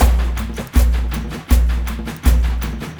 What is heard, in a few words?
AF TRANCE; Spectrasonics; Heart of Africa 2